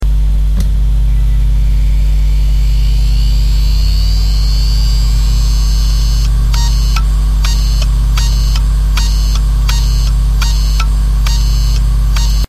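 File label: maxtor calypso scratched, hard drive; Почему у специалиста по восстановлению данных должен быть хороший слух?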